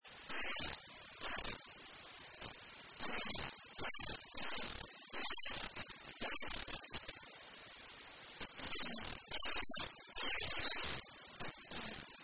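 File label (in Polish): jerzyk, głosy jerzyków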